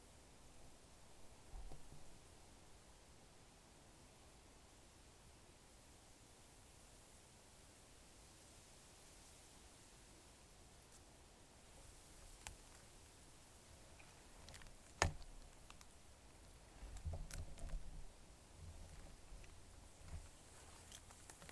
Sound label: Reedbeds Path; Newburgh